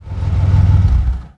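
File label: car switch off; auto A vypnuti; Mafie 1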